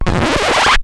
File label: Record Scratch; Rage